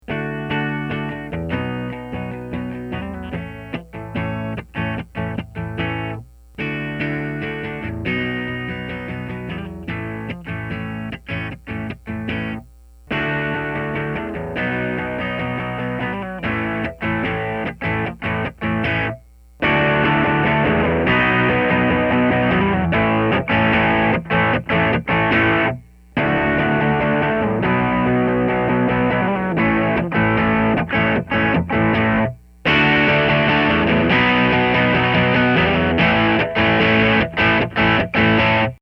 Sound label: ube screamer, bypassed, Gain 9, Tone 12; Gain 12, Tone 12; Gain 3, Tone 12; setup: pretty guitar - Ginger - Fender RAD Bass SS amplifier with EQ controls at 12 - mic - mixer - sound card